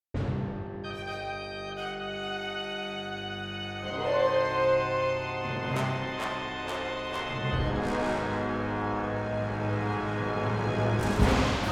Bagpipe; Music from Pokemon Re enacted by Ponies

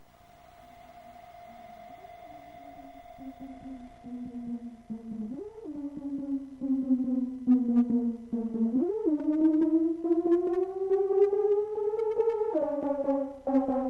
Livestream Mix

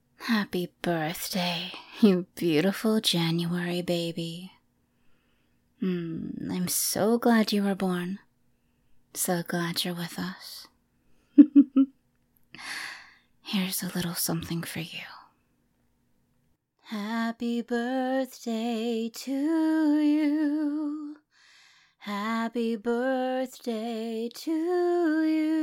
Happy Birthday, Singing; Janurary Birthday; [January]

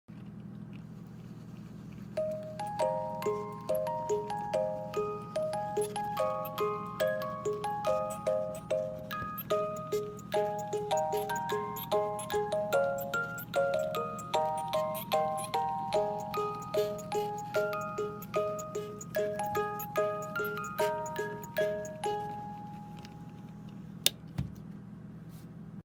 Music Box Snippet

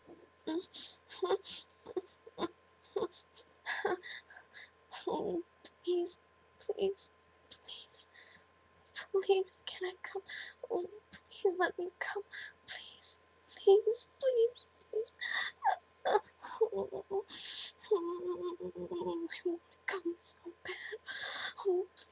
Begging, Whimpering, Moans, Loud; F4A; Big Finish

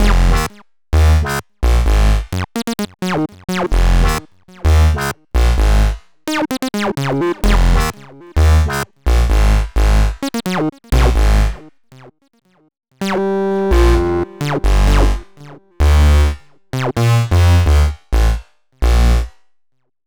basses; Pony Fan Music Remix Stems

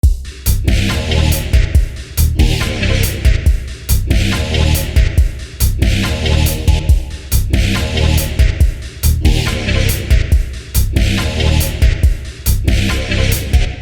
harmorstep

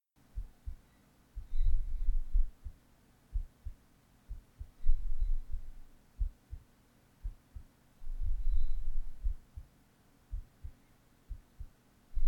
Heartbeat

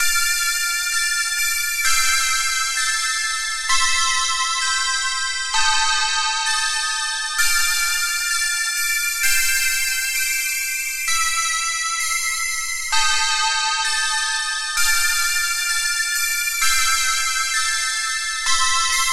short vst plugin instrument test; stupid dumb

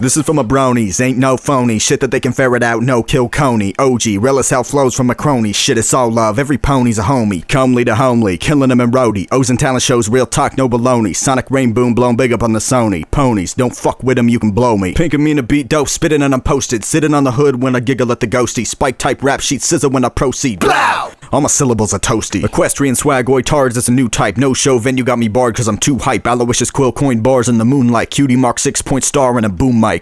Verse 1 (128 BPM), Vocal; Pony Fan Music Remix Stems

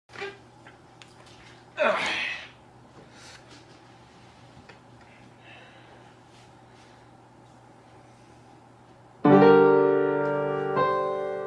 Piano Cover; Pony Music Archive 20.09

